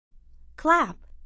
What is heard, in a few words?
clap